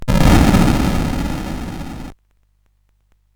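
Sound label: Moon Patrol, MOS 8580 SID chip music, games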